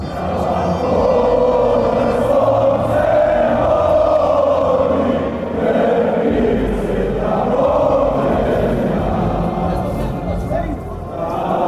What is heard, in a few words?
slovenia chant